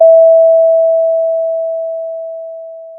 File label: Sound of a doorbell